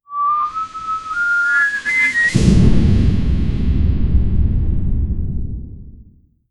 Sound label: soft cartoon thunder; uh-oh